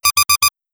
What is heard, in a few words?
Alarm; Kerbal Space Program v1.0.5; KerbalAlarmClock